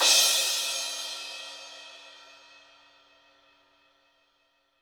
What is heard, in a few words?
HiHat & Crash, Roland TD-20; HiHats